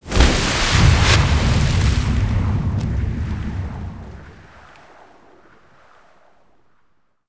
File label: Water Enter; Quantum Redshift